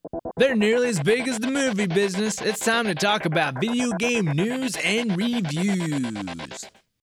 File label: video games